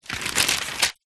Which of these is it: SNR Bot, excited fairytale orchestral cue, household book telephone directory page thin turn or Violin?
household book telephone directory page thin turn